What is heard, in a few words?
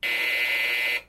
interphone buzz